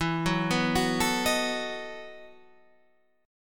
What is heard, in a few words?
E Major 7th Suspended 2nd Suspended 4th, Guitar, EM7sus2sus4 chord; arpeggiated